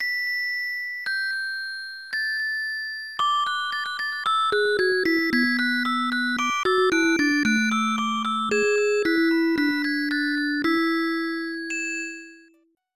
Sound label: Angel Chime; Super Momotarou Dentetsu II; SNES